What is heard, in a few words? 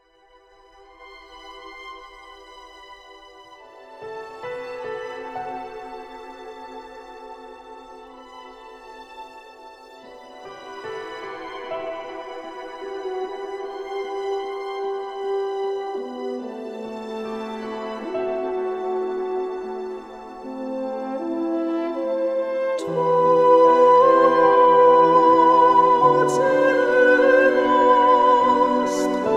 Sirenes